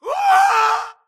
grunt death crush; Titanfall 2

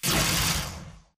bullet energy titan arc; Titanfall 2

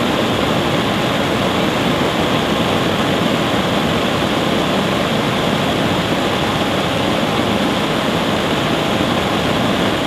fire jet large; Titanfall 2

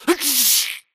grunt electro; Titanfall 2

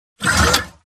Mvmt Servo Walk; AI Stalker; Titanfall 2

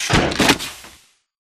reload canister in; archer; Titanfall 2

